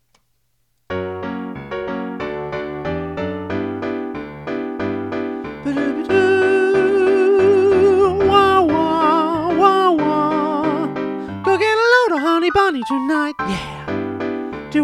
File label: Alto